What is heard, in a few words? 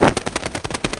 flap; aj; alita